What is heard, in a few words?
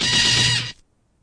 orch hit; Punches Hits Discoblasts; single hits